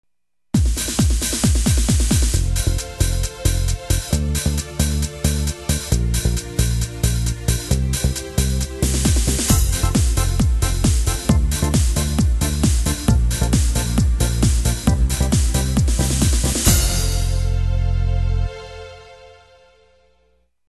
Techno; yamaha styles

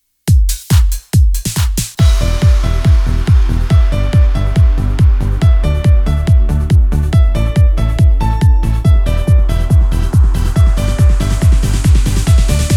edm; genos

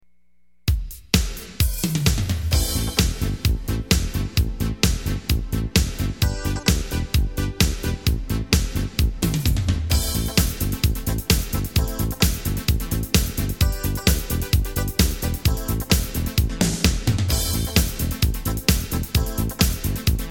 new wave; yamaha styles

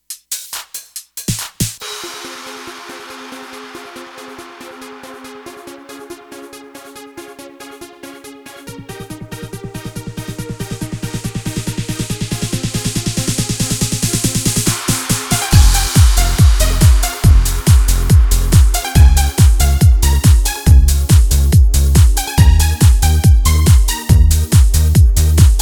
remix genos

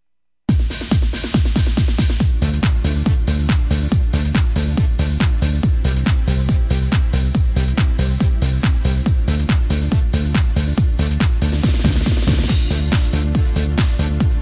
Trance; yamaha

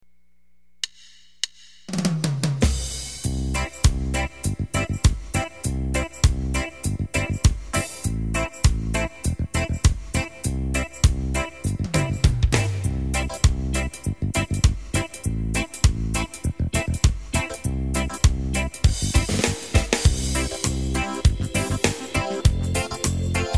reggae; yamaha

